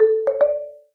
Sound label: Celesta, notifications; Honor; MagicOS 7.1